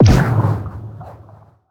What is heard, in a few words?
scavenger bot missile; Stellaris; distant stars